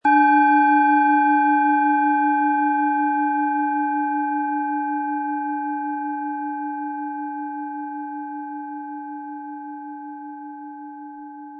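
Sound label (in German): Planeten Klangschale Saturn, mit Klöppel; 100-180 gr.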